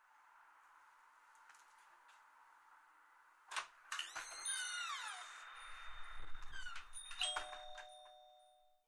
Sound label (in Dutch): winkel